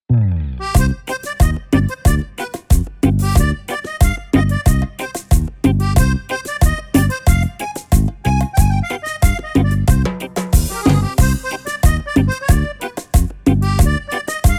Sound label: Cumbia